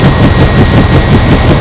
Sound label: helicopter